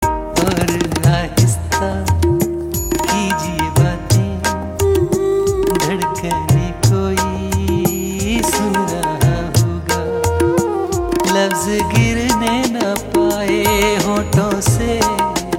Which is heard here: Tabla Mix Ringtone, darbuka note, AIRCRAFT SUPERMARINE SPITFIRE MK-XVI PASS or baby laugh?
Tabla Mix Ringtone